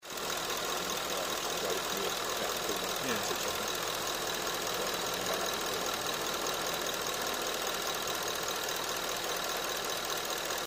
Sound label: 5 0 supercharged ticking engine noise